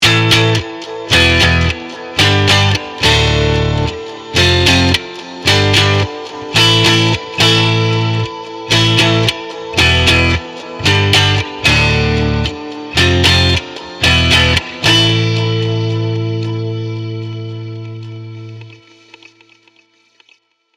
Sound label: Tailpiece + Amp + FX